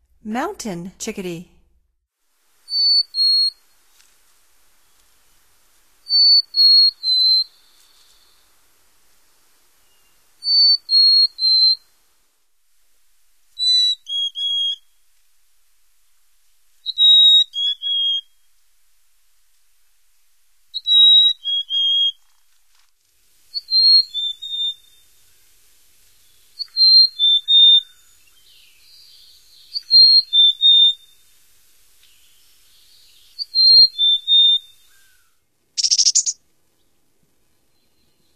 Mountain Chickadee, Songs of Birds of North America; Stokes